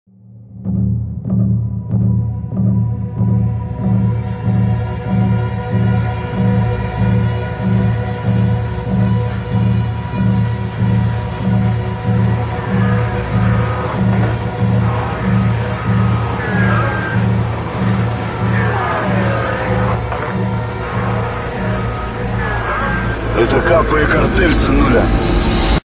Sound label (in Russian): shum raiona